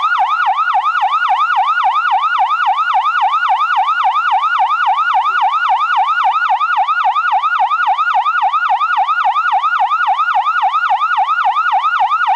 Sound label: POLICE SIREN; SIMUZEN